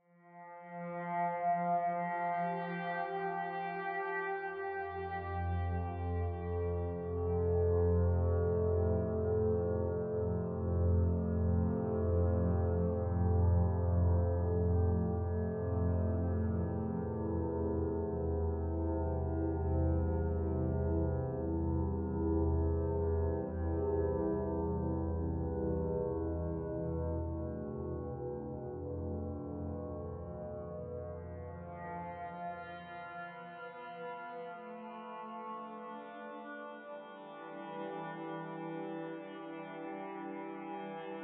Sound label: PADS